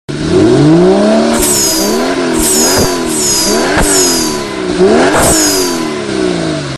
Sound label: porsche turbo sms